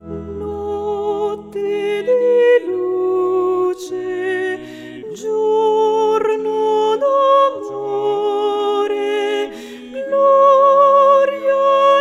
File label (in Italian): SOPRANI; natale 2023